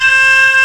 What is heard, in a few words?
HEAGUM C 4, KOREAN